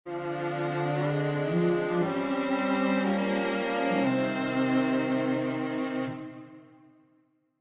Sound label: Ancient Ruin; modal non functional writing